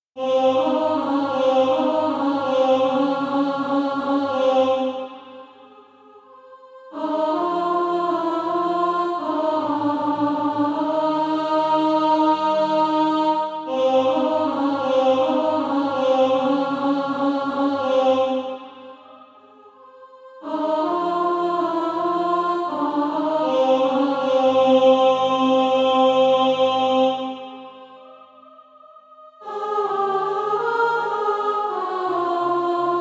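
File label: alto, Practise Music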